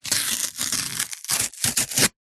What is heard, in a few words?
cardboard box small glossy skin rip; foley